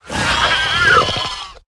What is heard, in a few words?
Marsuul Cub, Death